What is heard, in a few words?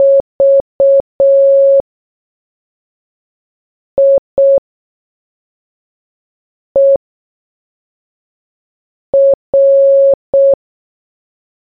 morse